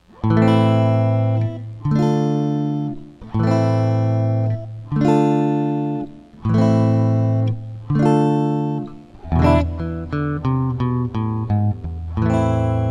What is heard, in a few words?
Open Chords; instructional materials; jazz tunes